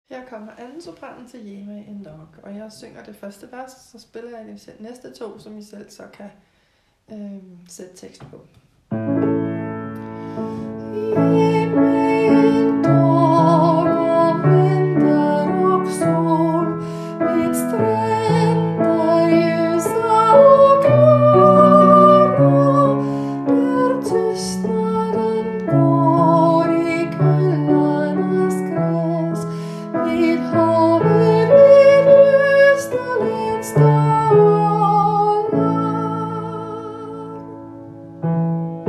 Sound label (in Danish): 1. sopran